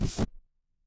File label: transition record scratch